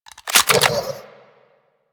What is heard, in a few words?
Reload Clip Out; Warframe; Tenno AR; Prime Tenno AR